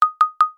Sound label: Knock, notification